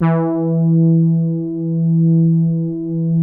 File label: soft brass, poly mid E3; Music Store - Claudius Diemeter Schwerlos